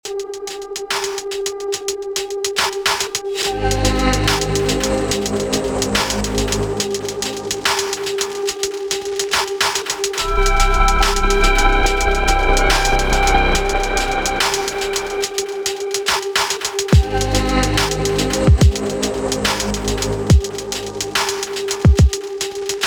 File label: piano chromatic